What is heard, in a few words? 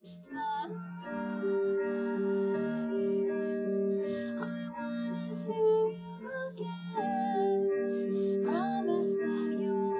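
with drums; SiSEC10; underdetermined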